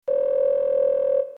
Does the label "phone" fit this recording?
Yes